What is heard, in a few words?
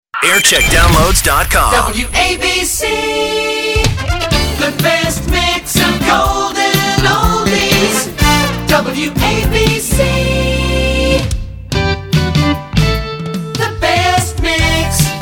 Jingle Montage; wabc